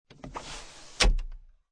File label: Car Interior Sunroof Cover Open, Car Interior, 1996 Acura Integra, Sunroof, Open Sunroof Cover, No Engine; Transportation Automobiles